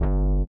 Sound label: LIQUITAR; Spectrasonics; Metamorphosis